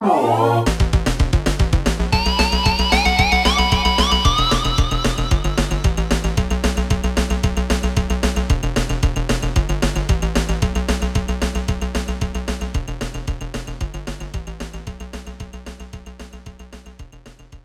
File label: Primal Rave clear; donkey kong country 2 fan remastered